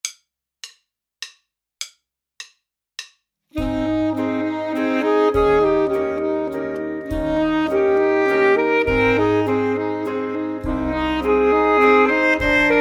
Full Sax

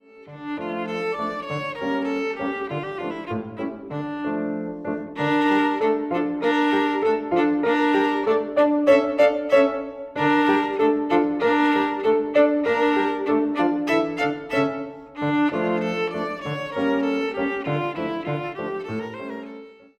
VIOLIN

